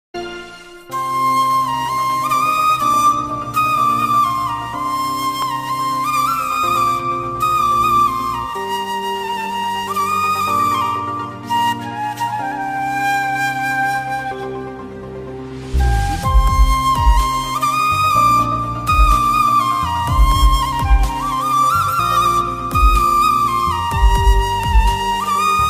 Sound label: flute ringtone hindi song ringtone; chhichhore